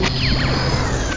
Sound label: swing out